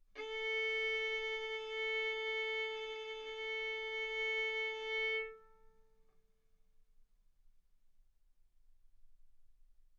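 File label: Violin arco mf A string A4 B4 stereo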